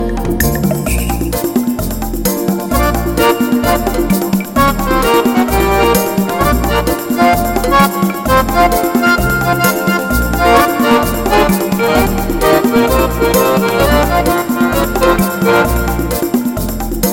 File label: Happy Unplugged Swing